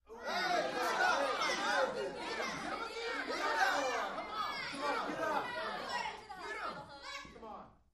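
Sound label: Crowd 15 Interior Rowdy; small int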